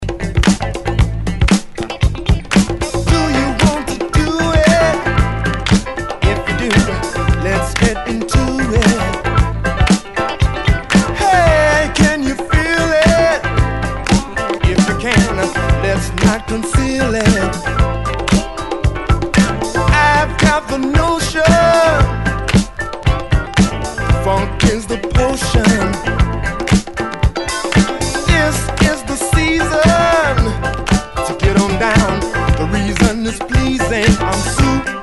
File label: 70s ReDrum 115 Bpm; DJDOLLS; remixplanet